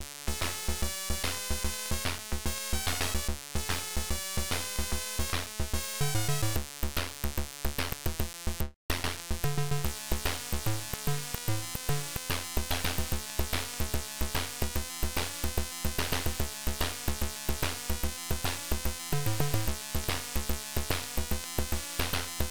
Title AY Beeper; The Arc of Yesod

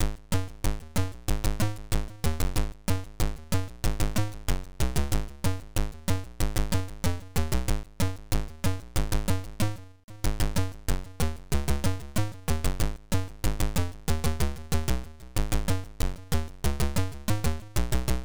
bass theme

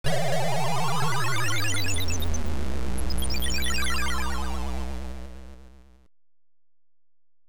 Title AY; Hawk Storm

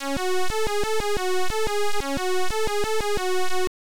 Start, Beeper; Hunchback

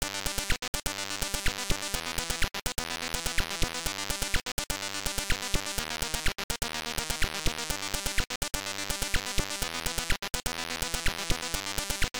Title Beeper; ATV Simulator

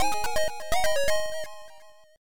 Jingle

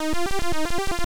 Title Beeper; Steve Turner; Quazatron

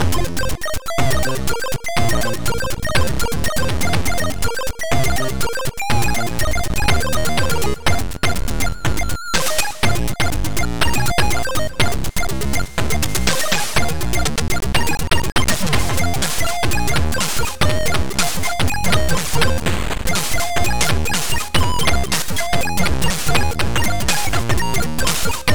funk

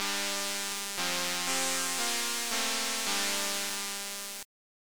Transfer Beeper; The Sentinel

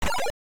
Title Beeper; Hydrofool

